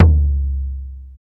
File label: long tom single hit c sharp key